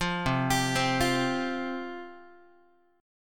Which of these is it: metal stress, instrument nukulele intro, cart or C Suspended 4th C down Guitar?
C Suspended 4th C down Guitar